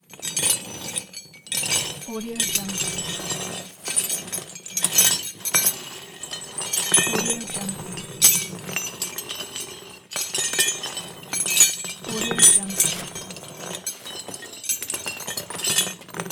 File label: metal chain dragging; soundjay